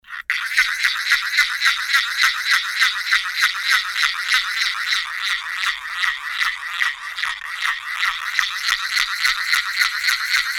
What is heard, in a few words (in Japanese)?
nakizemi